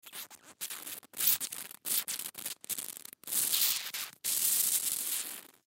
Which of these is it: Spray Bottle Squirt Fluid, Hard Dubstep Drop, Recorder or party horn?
Spray Bottle Squirt Fluid